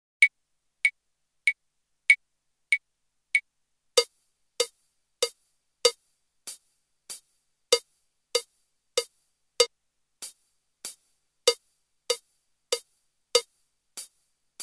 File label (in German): Rhytmische Klatsch Ubung